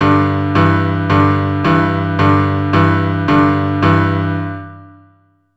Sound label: Blues Bass G, keyboard